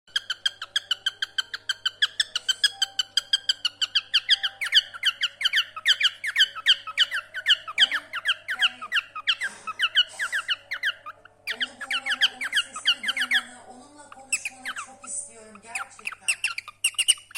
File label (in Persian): صدای عروس هلندی نر